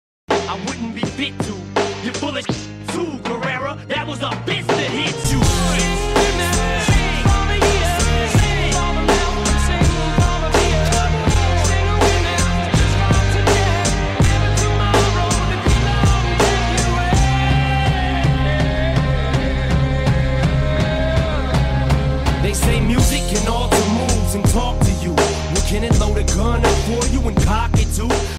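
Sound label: music ringtone instrumental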